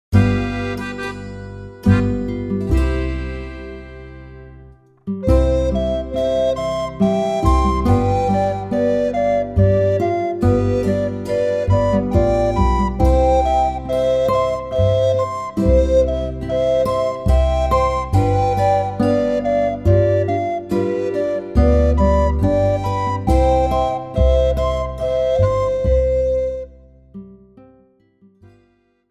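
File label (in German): Jodler, Flötentöne; Lassinger Eisschützen-Jodler; Jede Menge Floetentoene 1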